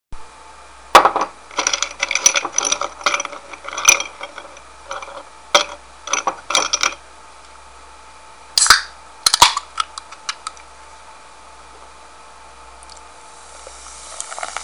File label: sprite can